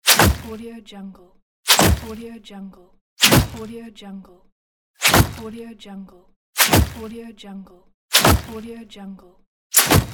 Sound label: arrow impact; gamechestaudio